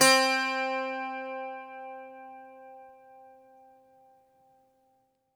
Greek Bazouki, Plucked Strings

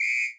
percussion, Millenium MPS-400

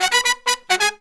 132, Pop & Funk Brass; Best Service ProSamples vol.25; AKAI

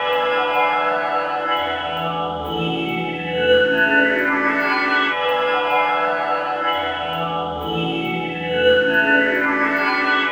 Ice clouds, Pads; Sonic Foundry (Sony Creative Software); Synchro Funk

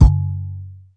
Kick; Sonic Foundry (Sony Creative Software); Esoterik Beatz; Mecan Kit

